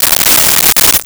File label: Coins Poured Out, Foley